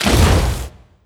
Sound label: lava splash in; war